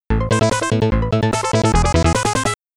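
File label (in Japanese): Menu Jingle; Alantia; PC-9801; メニュージングル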